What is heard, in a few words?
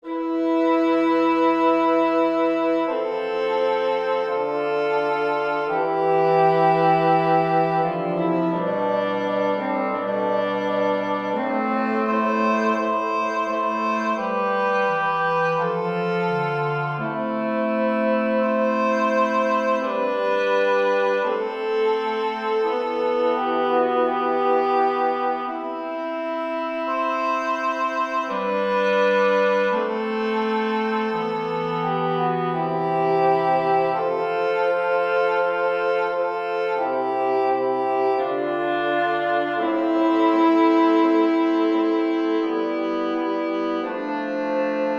tutti